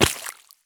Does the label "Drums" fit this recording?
No